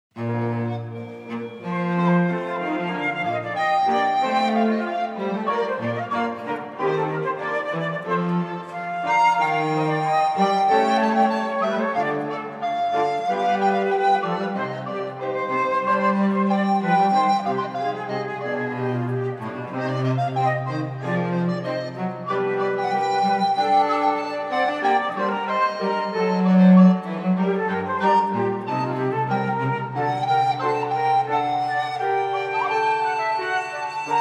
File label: Music Only Vivaldi Allemande